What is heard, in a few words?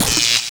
ELECTRO PISTOL